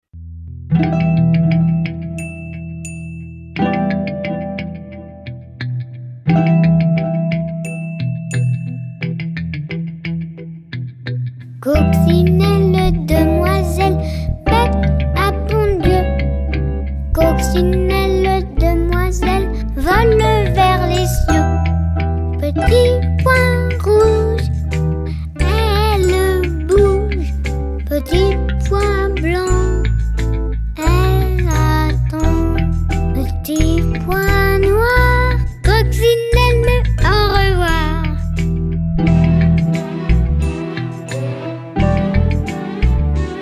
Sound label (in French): Coccinelle demoiselle, comptines pour les tout petits; Livre Audio – Comptines pour les tout-petits